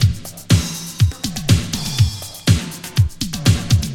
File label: 122 bpm classic breakbeat c sharp key